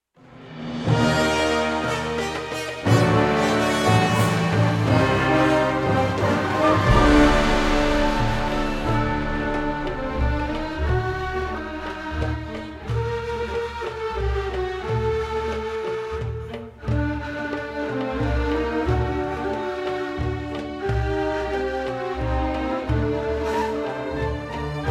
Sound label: Middle Eastern Mix